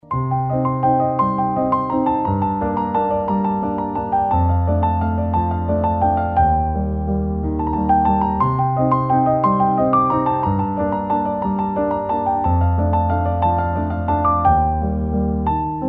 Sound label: Ringtone, پیانو